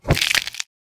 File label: bone breaking impact